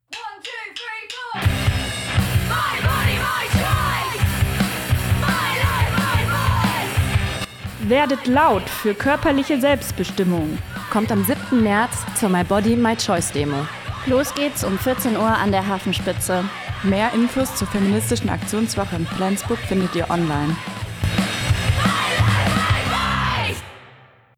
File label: Jingle